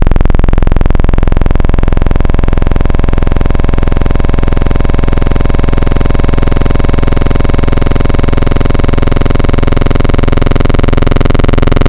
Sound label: Analog SH101 Saw; Synthetic's Serum Collection; Echo Sound Works - Modular